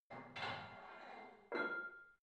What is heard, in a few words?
barbell plate put on hit ring reverby soft, fitnessstudio